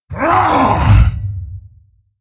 Attack, Creature; Steelbreaker

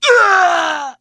wound crit; Mage Lord Urom

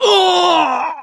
troll crit; Halazzi

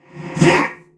Wound; Deathbringer Saurfang

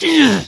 troll wound; Halazzi

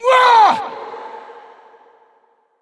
Attack; Creature; Bolvar Fordragon

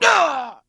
wound; Mage Lord Urom

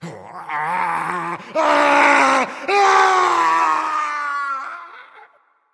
Hood Wolf Dies

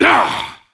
Attack Medium; Varimathras

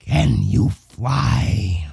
stomp; Creature; Drakos The Interrogator

